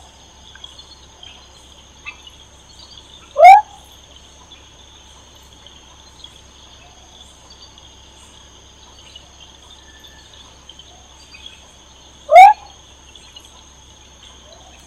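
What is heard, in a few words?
Leptodactylus stenodema, frog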